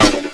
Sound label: freeze mortar; Army Men TiS